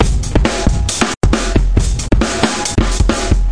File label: 136 bpm overloaded bigbeat